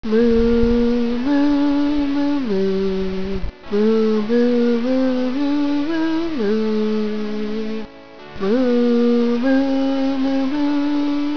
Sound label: moo